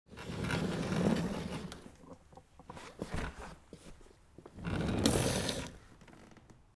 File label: Dragging a rolling chair